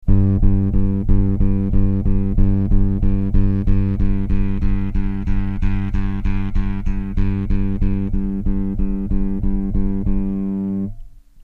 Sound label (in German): regler tone; ak-workshops